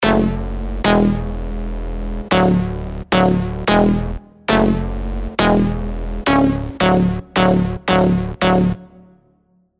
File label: STAB; AFTER STAB